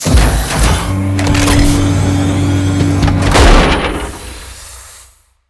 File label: delivery drop; Original TK Sounds